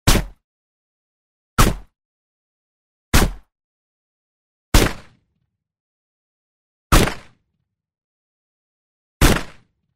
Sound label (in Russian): vintovka s glushitelem